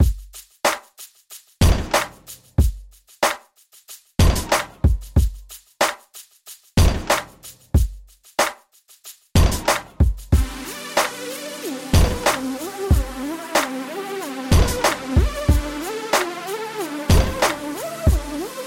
drum beat ringtone; iphone ringtones

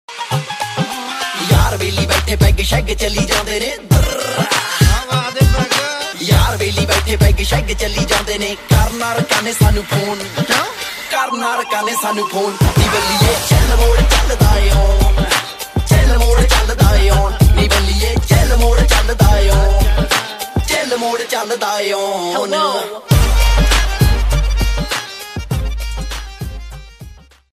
punjabi ringtone; bollywood ringtones

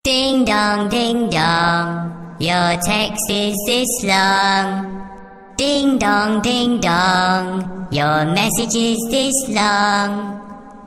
ding dong text ringtone

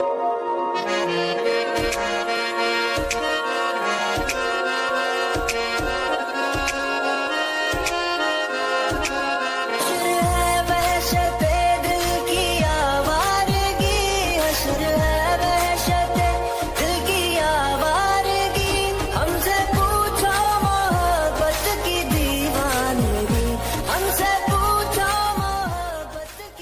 very sad ringtone, bollywood ringtones

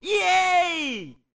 yay ringtone; mikau

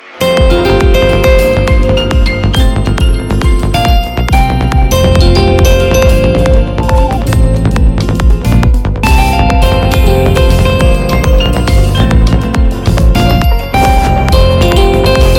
lg v50s thinq 5g ringtone

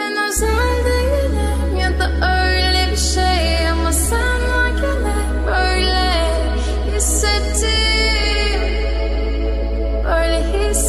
english dj ringtone

